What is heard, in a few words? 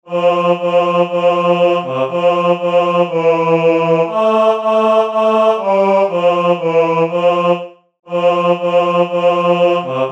Hark The Herald Angels Sing Bass, Midi; germersheim; Weihnachten